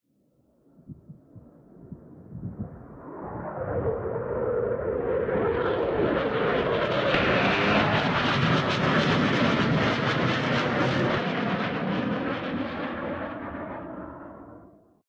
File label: f16 right-left firing, aircraft; Civ, Vehicles